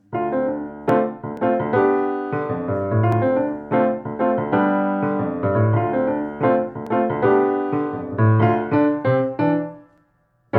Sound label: Jazz